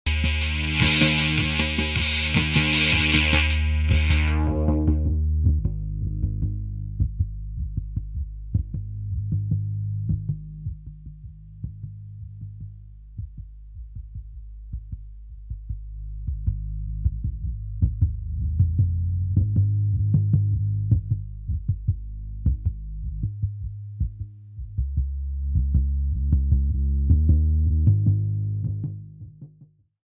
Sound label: Car